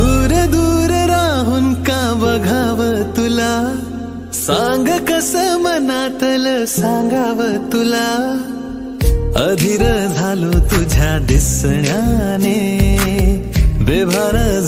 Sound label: Marathi Song Ringtone